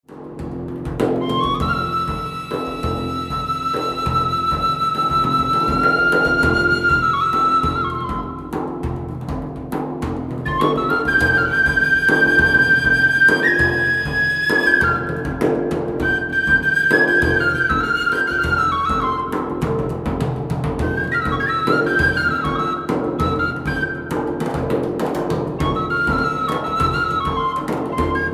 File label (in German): Arabic Flow langsam, flute